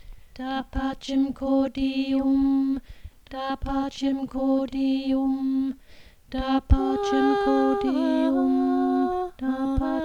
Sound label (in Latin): Da pacem cordium kanon